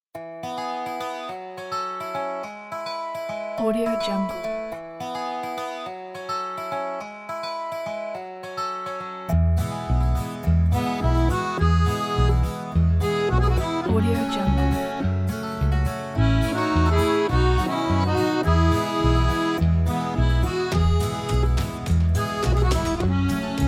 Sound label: folk; blacksmith